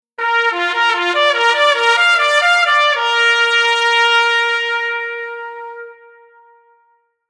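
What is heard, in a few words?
Horn Unison 2 Trumpets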